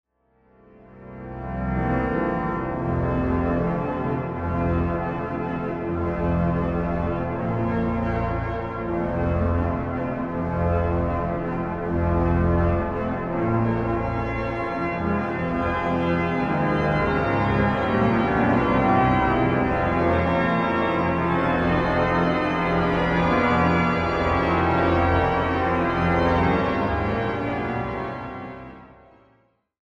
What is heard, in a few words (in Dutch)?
Toccatine, orgel; Ik Roem In God; pieter heykoop improviseert haarlem